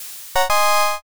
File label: challenger